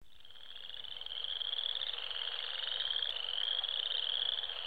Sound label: western chorus